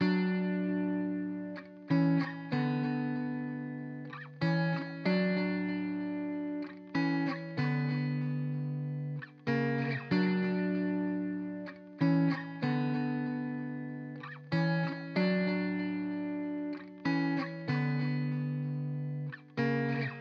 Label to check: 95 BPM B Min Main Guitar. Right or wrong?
Right